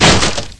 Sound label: crate smash; Ferazel's Wand Demo